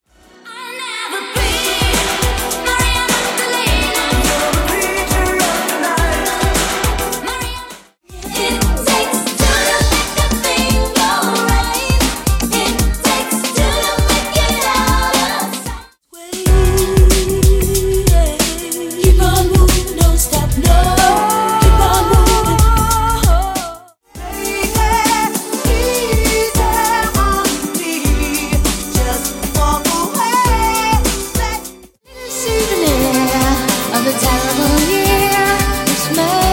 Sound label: 80s 90s Classic; 10 Edits Packs V2